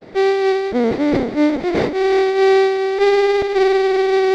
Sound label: violin